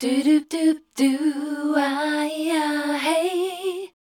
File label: C, Voice Spectral; MELODIC; Best Service; Voice Spectral II